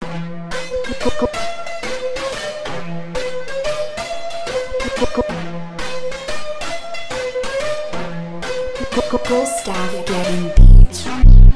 jerk brazil phonk

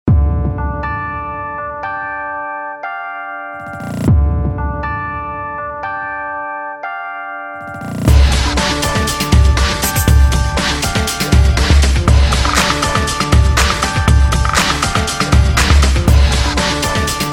Break beat mix

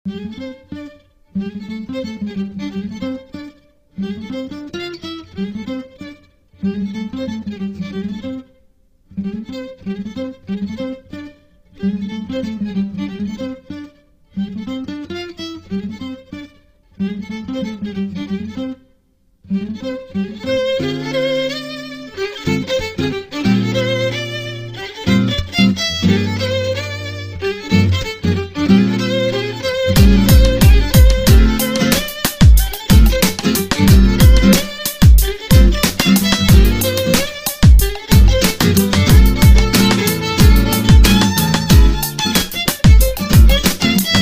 Celtic Blues Beat

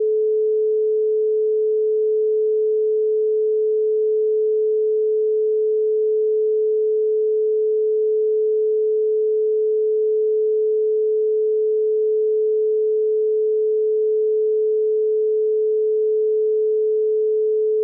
432 Hz Pure Tone

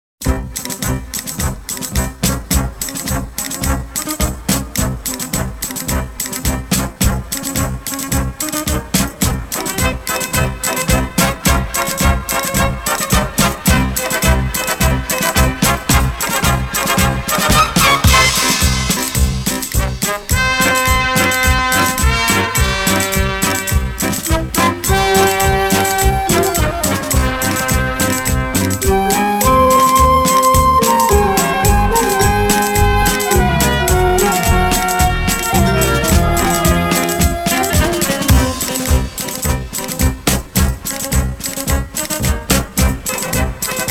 Slow paso 53.4 bpm

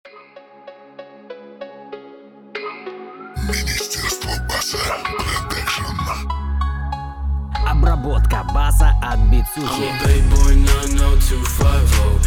Bass

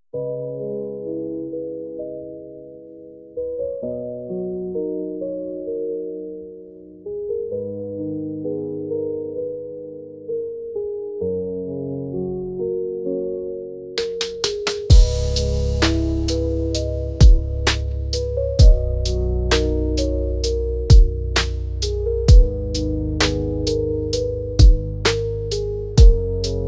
lofi

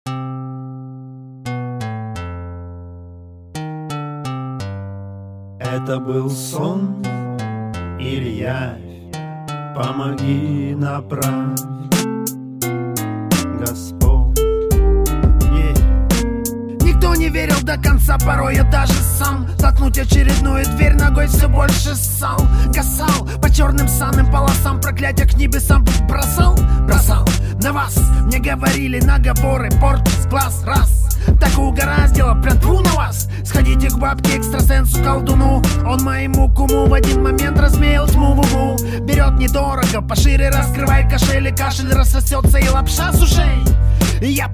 acapella

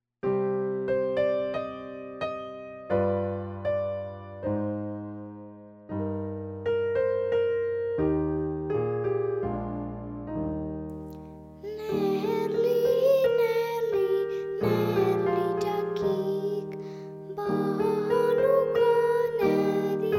Chanukah Song solo with piano accompaniment

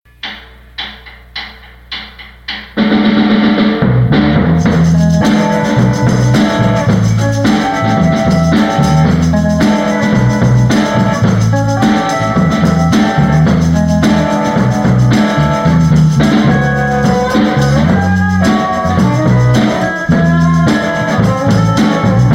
Harmonica

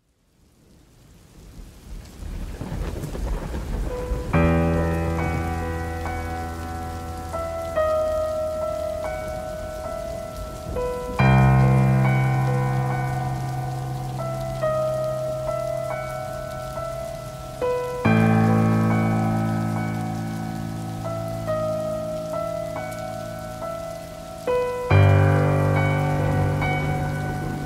Piano Strings Soundtrack Rainy Mood

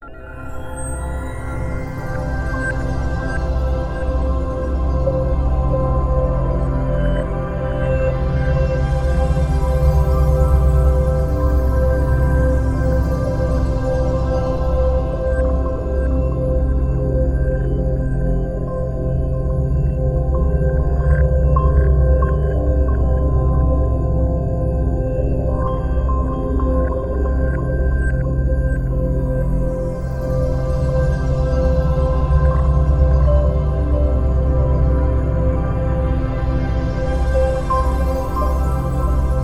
Calming Drones

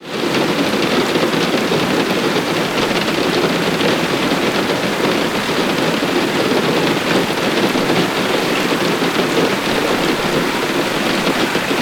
Asmr Rainfall

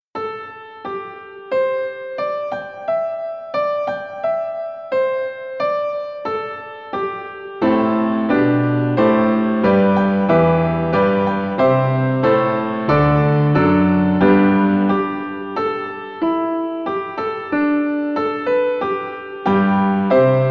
Solo Piano Music

